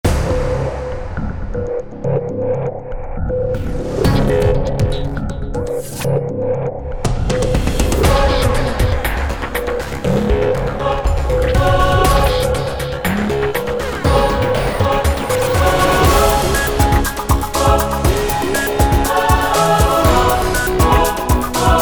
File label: Techno